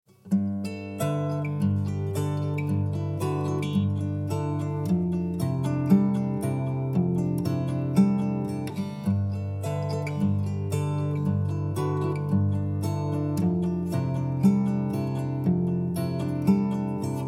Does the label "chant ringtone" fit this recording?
No